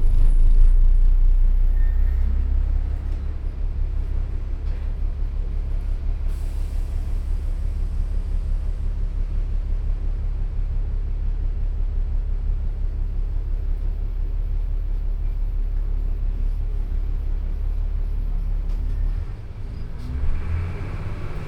im bus, Brasilien

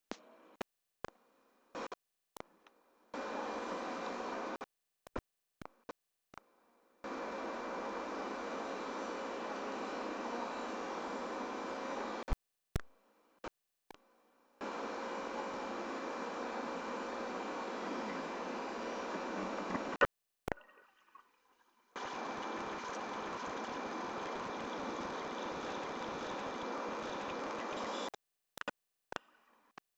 mikrotest; brasiltagebuch

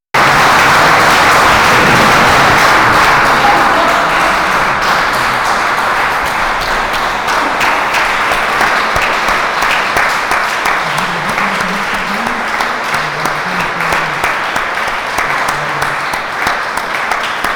Klatschen Im Kunsthaus Bethanien; Fieldrecordings

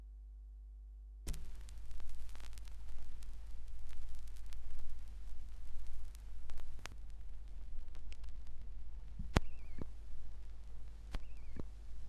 schallplattengeraeusche

